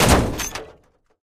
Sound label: bumper car jump land; tf